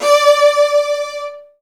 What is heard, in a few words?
Virtuoso Strings